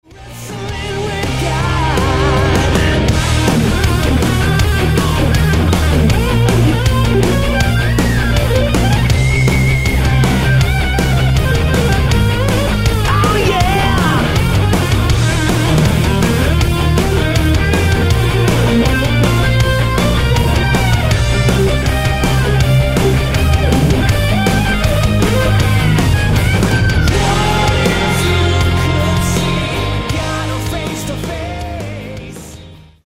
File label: solo